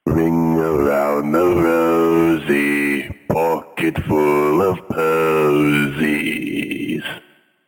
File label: singing